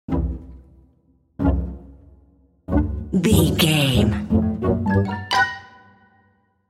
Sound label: cartoon